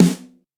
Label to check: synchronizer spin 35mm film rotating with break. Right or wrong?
Wrong